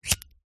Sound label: household cigarette lighter flint flick